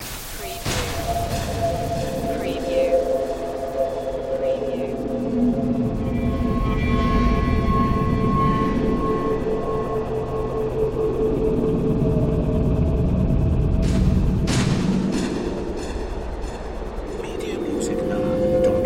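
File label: Production Element Chopper; Sound Effects Bible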